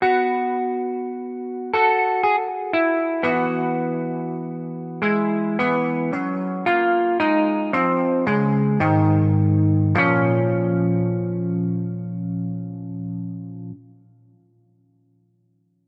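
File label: Lose, game music; Project Reality BF2 1.6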